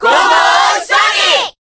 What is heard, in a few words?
Cheer Korean; Sonic; SSBB